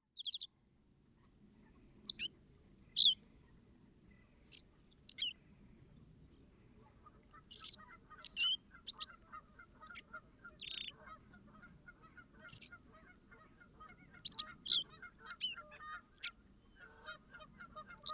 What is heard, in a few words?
Emberiza schoeniclus slow song; Hbgsjön